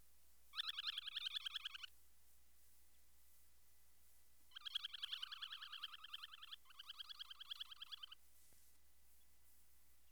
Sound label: semipalmated sandpiper